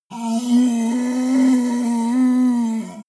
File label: yeti groan long dying; kreaturen